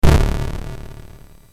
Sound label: BASIC sid MOS6581R4, GAMES; Sword of Fargoal